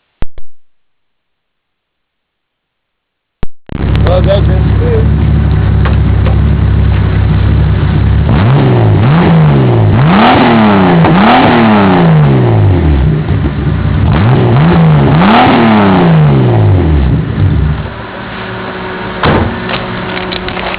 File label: car sound; zcar